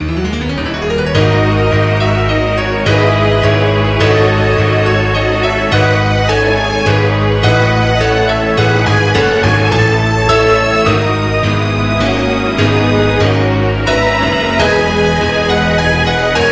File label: Ballroom Transition